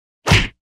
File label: fights